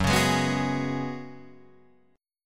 F sharp Suspended 2nd Suspended 4th, Guitar Drop D